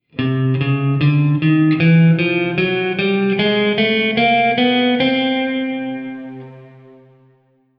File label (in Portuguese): Escala de C Cromatica